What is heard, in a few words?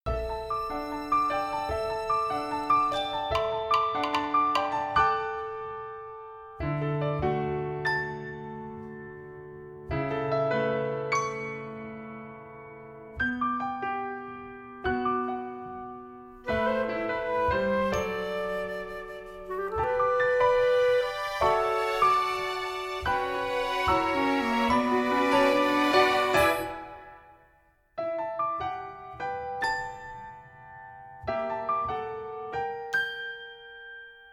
young anna accompaniment